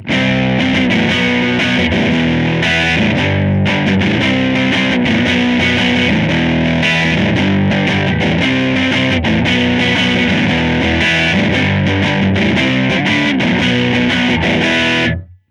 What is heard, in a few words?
crunch, spidervalve; line6; sortie pod